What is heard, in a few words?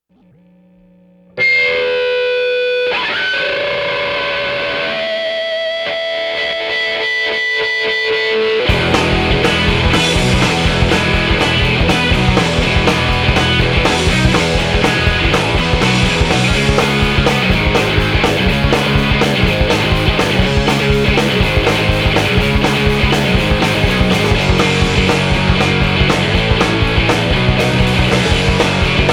Instrumental; Unreleased Instrumental Demo